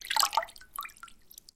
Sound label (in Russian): voda potok korotkij nezhnij blizkij